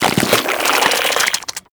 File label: Infested Devourer Extend; Warframe